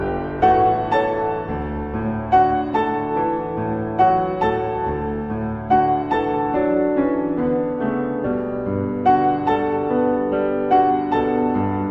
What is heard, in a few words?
piano